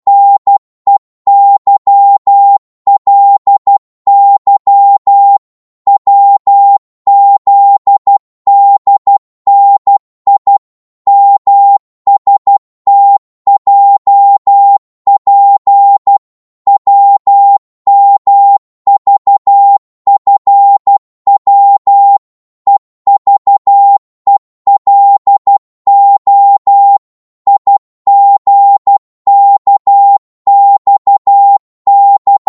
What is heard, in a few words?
12wpm, Morse